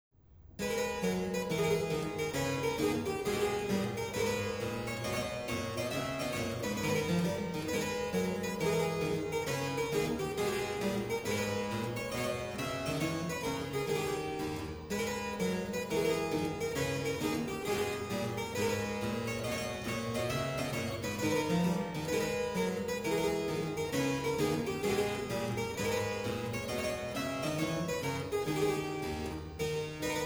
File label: gavotte; dela guerre